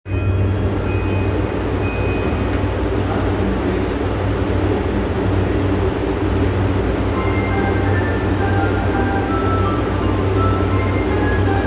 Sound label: train sounds; 南北線志茂南